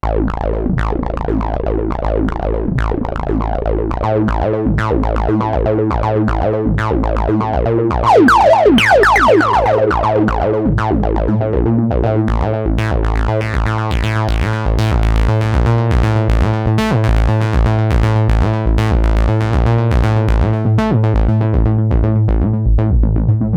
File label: bass tb; TB-303; arturia origin; Minimoog; basses; du gros, du gras et du lourd